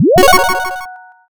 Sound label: boot